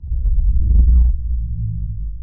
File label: d bass dist